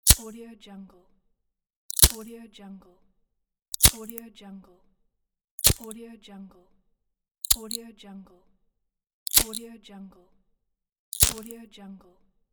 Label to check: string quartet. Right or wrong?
Wrong